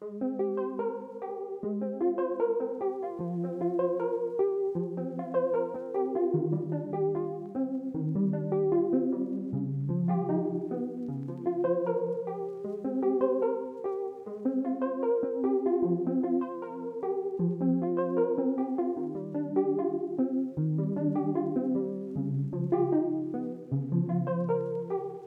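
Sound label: Jupiter arp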